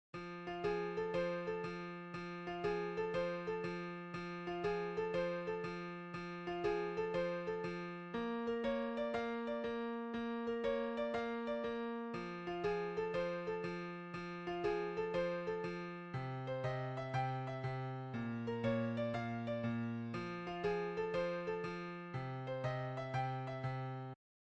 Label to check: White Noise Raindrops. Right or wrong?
Wrong